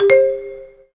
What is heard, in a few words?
Weapon Title, Interface